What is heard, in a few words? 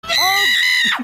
Shriek; Matt Watson